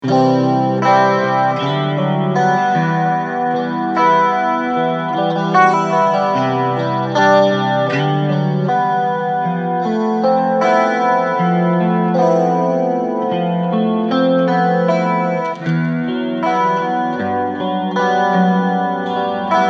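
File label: Boutique Chorus Delay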